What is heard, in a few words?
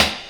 SD HOU, Dance Mega Drums; Best Service